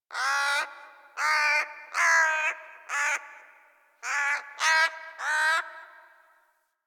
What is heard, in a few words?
Tree Critters, Ambience Plains; Warframe; Eidolon Plains; One Offs